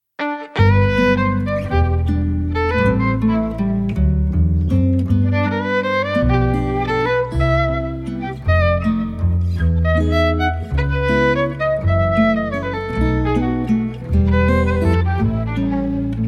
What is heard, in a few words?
Sweet moment violin